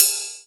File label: Ride, Cymbals